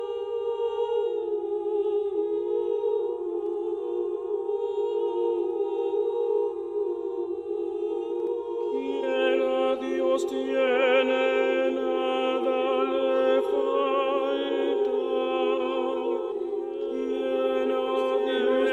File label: nada te turbe tenor